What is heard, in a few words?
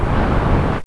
wind